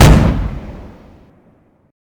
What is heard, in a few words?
explosion flashbang; ufoai; weapons